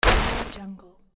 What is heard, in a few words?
fire arrow